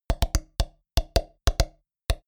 drop wood

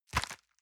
envelope grab hard

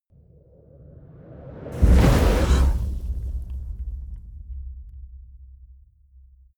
whoosh fire torch hit

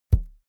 balloon hit head low

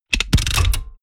mechanics medium rattle

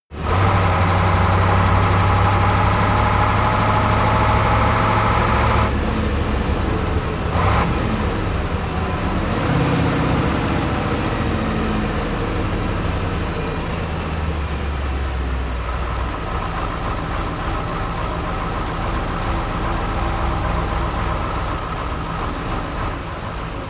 truck ural 4320 driving decelerate idle stop

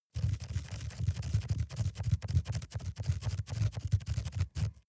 rat run trample fast

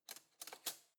cutlery spoon drawer place soft

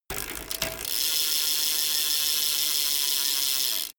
bmx bike pedal once freewheel long

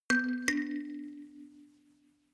notification kalimba rattle third up

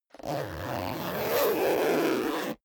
zipper down jacket close

